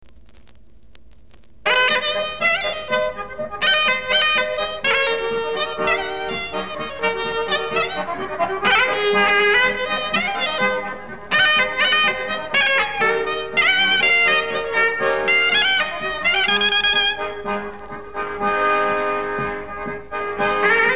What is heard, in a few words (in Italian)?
polka